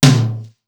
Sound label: Simmons SDS2000; SDS2000 Factory3